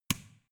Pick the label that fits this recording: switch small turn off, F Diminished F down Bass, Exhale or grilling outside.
switch small turn off